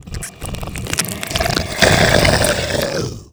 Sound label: Leg Regen; Freloan; Seer; GamigoNA